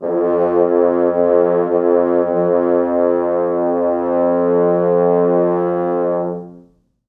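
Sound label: 4 French horns sustain F1; East West; Quantum Leap Horns Sax; 4 FrHorns SUS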